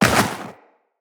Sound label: Walk Snow, Footsteps; Tenno